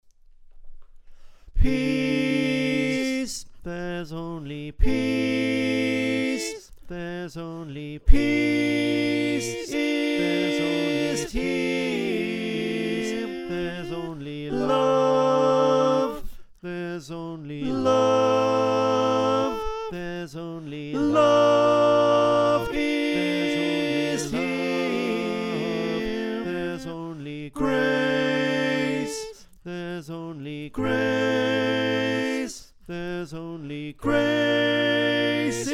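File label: Tenor, choir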